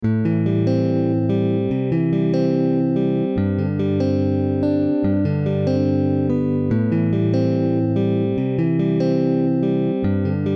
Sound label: Chorus; Mikron Chorus